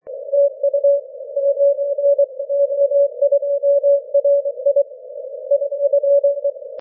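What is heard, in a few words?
17m continuous wave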